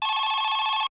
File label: Technology Electronic Phone Ring Single